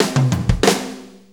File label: 6-8 Fill, Drum; 6-8 Loops; Fills; Drum Tools